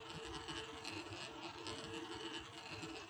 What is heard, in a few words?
Ball Roll Track, Roulette wheel; Real Dealer European Roulette